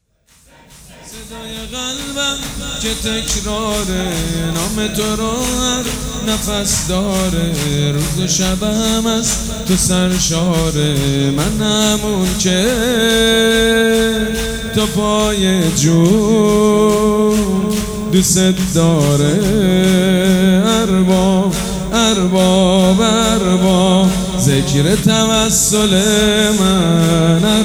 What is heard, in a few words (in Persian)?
صدای قلبم